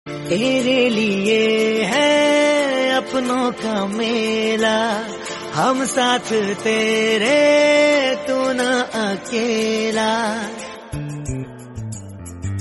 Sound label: Sister Birthday Song Hindi